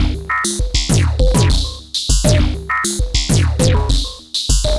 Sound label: Ratchet Beat